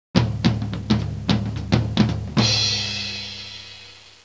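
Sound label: rock drum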